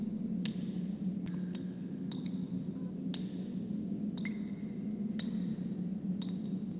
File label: ambient underpass; csg militia